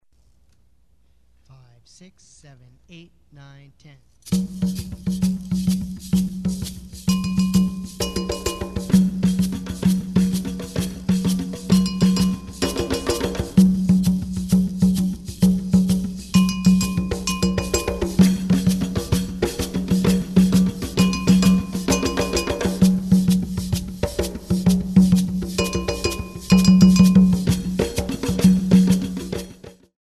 drum

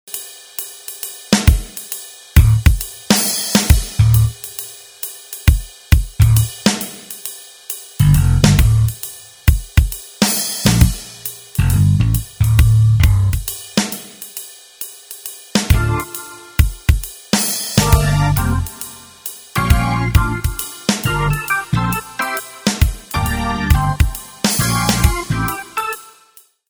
piano

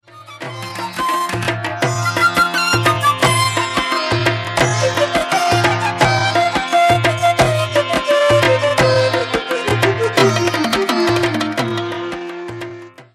jazz, Piano music for Modern

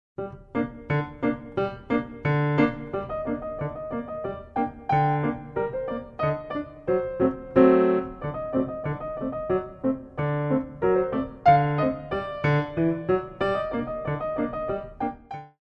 jump; tendu

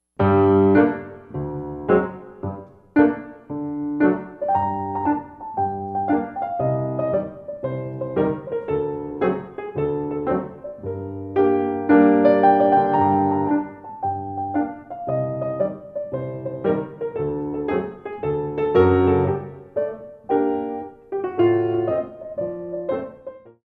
schottische

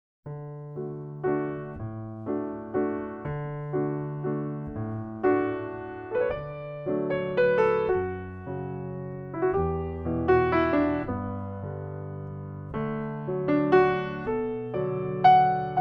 adagio; tendu

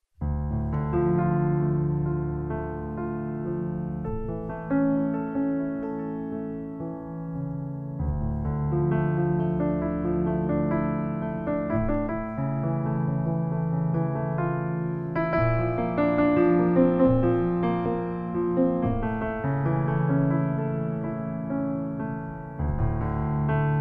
plie

